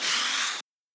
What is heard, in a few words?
paper